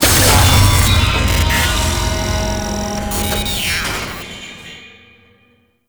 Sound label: Elevator Lift Abrupt Stop; Warframe; Tau; World Seeder Descent